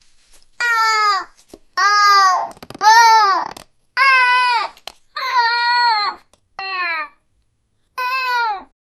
child happy sound

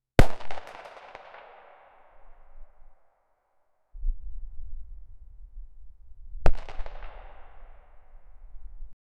the sound of many small, cannon

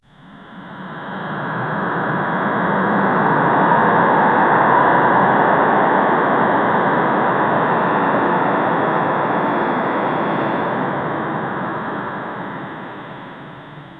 sounds of a city at, bustle

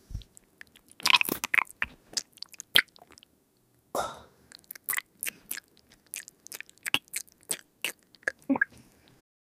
digestion sounds sloshing; human body

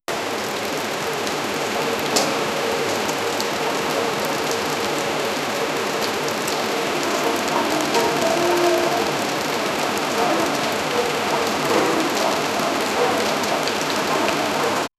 an otaku room with drawin

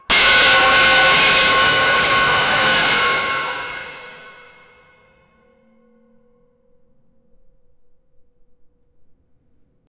extremely loud and heavy, destruction

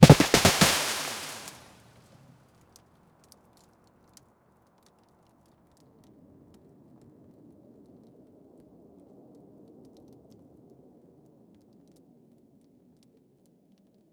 de havilland canada dhc 2, crashes

